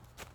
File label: walk